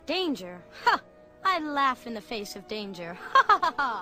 laugh in danger; Disney

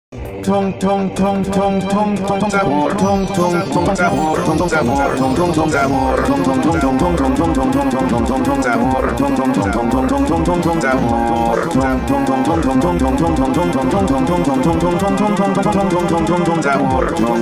slowed, reverb

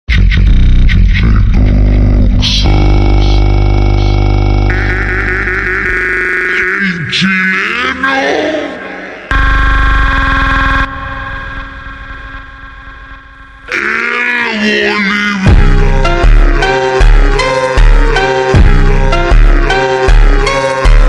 Funk super Slowed

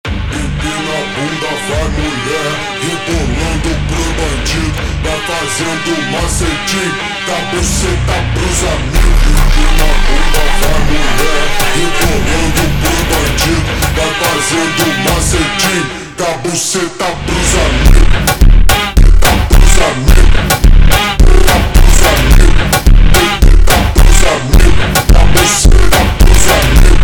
Doom Funk slowed

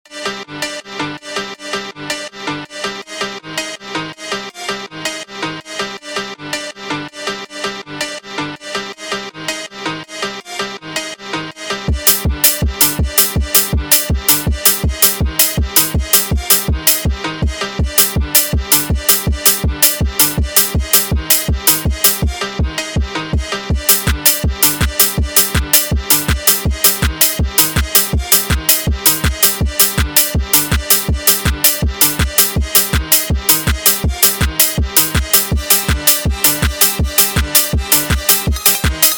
Phonk Remix, nightcore; call Of Duty Zombies

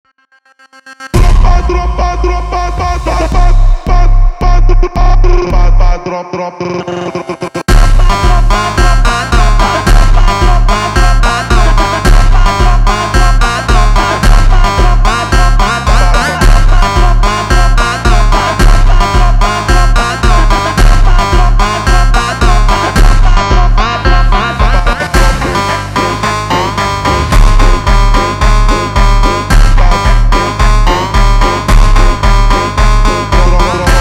Kung Fu Funk slowed

